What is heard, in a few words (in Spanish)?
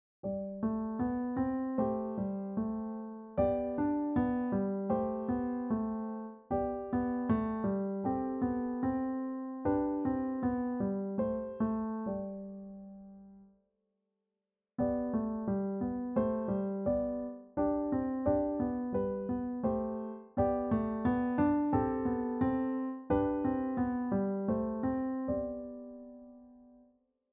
Dobles notas Sol